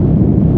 Rumble Loop; Playable Tutorial Level

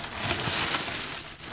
Pushables Move Grass; Playable Tutorial Level

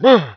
Grunt; TR3; Playable Tutorial Level

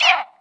Vulture Glide; Playable Tutorial Level; TR3

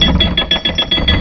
Chain Pulley; Playable Tutorial Level; TR2

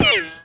Bullet Ricochet; Playable Tutorial Level

